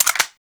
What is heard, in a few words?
Eject Clips; Warframe; Latron; Ak Latron